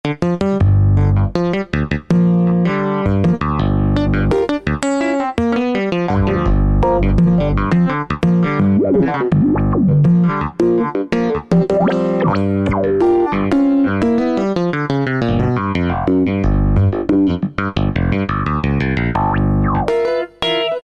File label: '60 Comp Klav